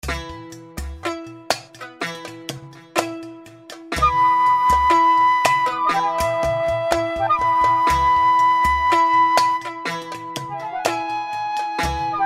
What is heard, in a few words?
Combinator Far East Split; Propellerhead Reason 3.0